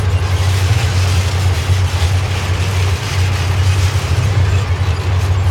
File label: tank track